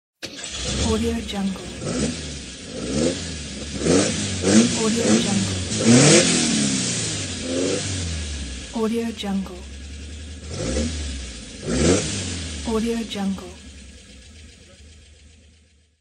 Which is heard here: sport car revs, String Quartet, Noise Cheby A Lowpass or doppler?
sport car revs